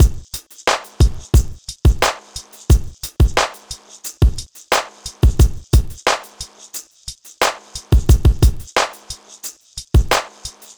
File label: Drums, Hip Hop; Sonic Foundry (Sony Creative Software); Lukecage Underground Soundlab Hip-Hop